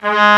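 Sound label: Trumpet mf G#2; Digital Sound Factory Virtuoso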